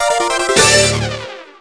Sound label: PVP END